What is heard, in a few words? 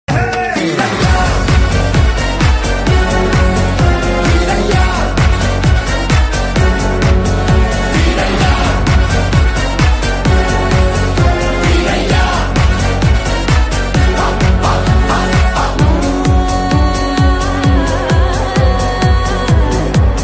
intervel bgm ringtone; walter veerayya